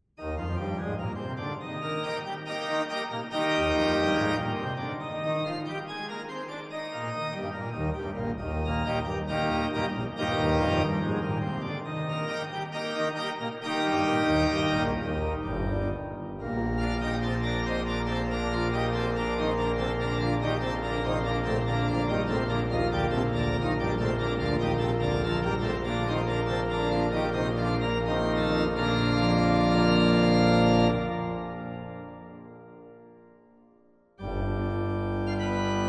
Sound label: pipe coupl default